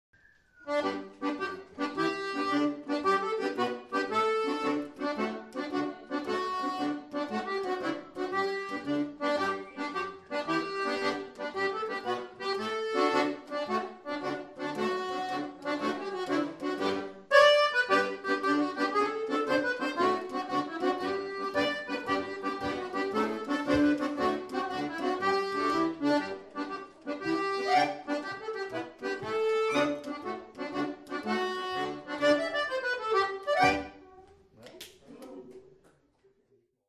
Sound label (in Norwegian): Leikaring reel